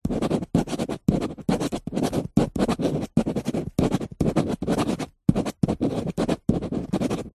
office pen biro writing on glossy paper

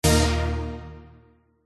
game sound big arcade retro notification event

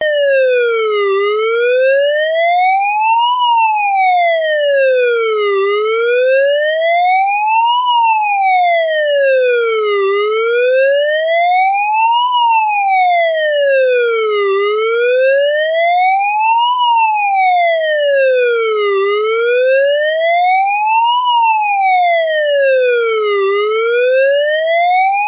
emergency police siren isolated clean; zapsplat

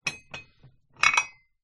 Weights

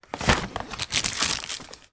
box chocolates plastic sheet remove; foley

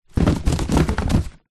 foley presents fall over many; zapsplat

